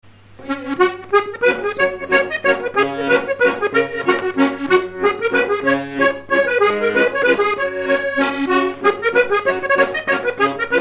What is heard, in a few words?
mazurka; La Chaise Dieu 43